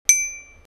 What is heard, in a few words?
ding